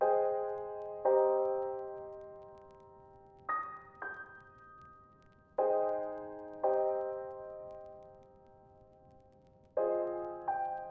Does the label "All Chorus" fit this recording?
No